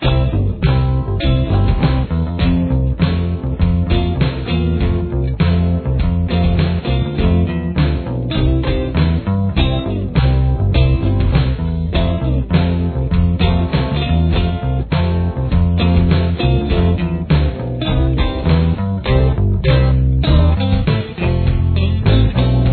verse mix

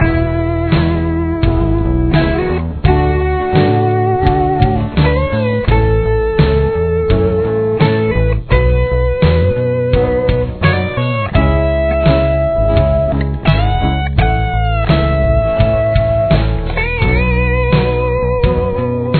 bridge mix

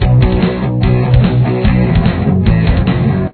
chorus, guitar; looped at the normal tempo of 146 bpm

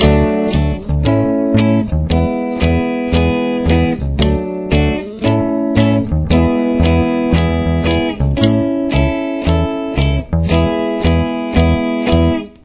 chorus two, guitar

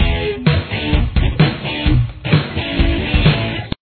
interlude

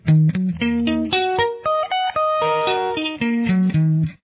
Arpeggios Em style, guitar

